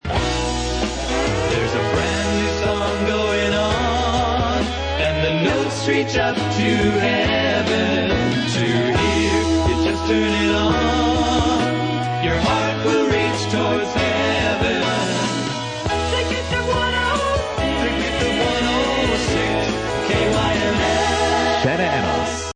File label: Jingle Brand New Song; KYMS